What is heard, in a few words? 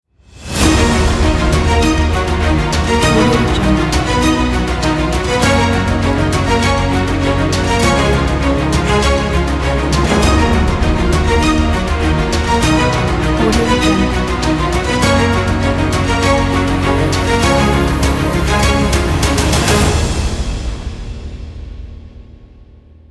the trailer cinematic epic logo pack